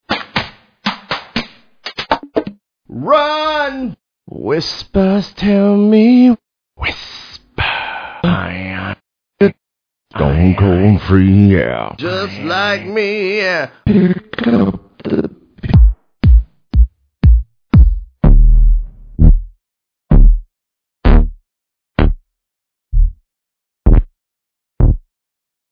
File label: total techno